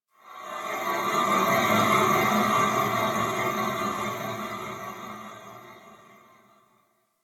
Chime Texture; Warframe; The New War; Zariman; Apartment

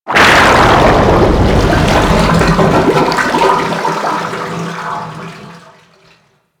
Ocean Metal Tube Water Drain; Ambience; Warframe; Grineer Ocean

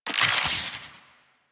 Infuse Corrosive UI; Warframe; Warframes; Alchemist

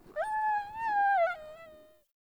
Wolf Whine Concerned; Animals; Perception

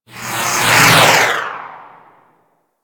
Tractor Beam Rail Random; Crp Space Bridge Transition

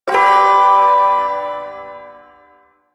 Ersatz Shawzin Maj Chord; Warframe; Duviri Ersatz Shawzin

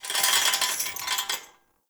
Cage Rattle, Hanging Cages; Puzzles

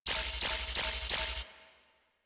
Bomb Carry Fuse; Missions; Raid; Grn Bomb Objective

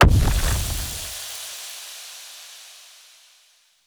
Fey Mist Impact

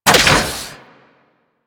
Archwing Hatch Open; Warframe; Railjack

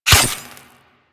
Melee Dagger Impact Robotic Flesh

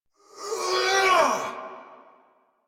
Attack Effort; Warframe; Dax Ashigaru; en

